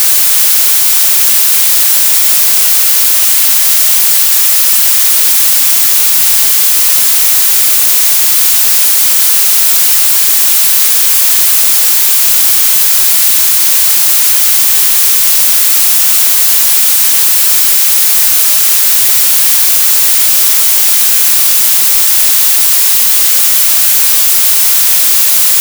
Blue Noise

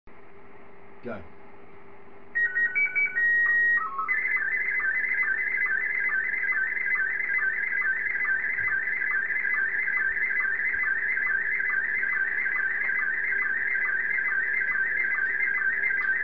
sstv

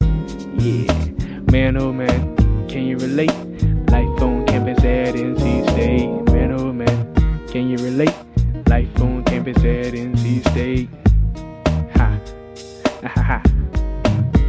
Lifetone; NCSU

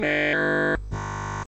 strange tones

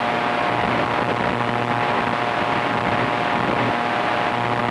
15MHz RFI

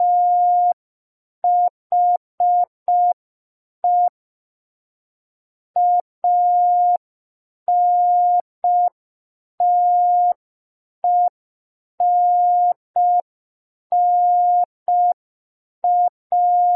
Morse code P; Morse Listening Exercise 2

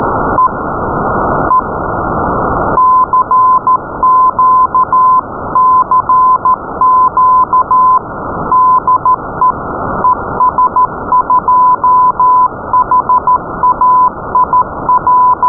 beacon CW; es2hail

